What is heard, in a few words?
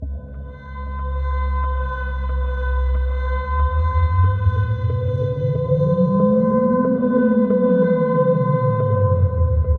Scary, nightmares; Dream Zone